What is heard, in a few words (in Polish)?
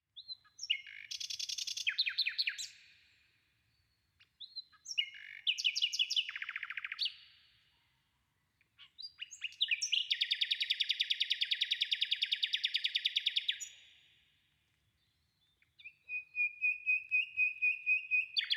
Odglosy zwierzat spiew ptakow; Odgłosy zwierząt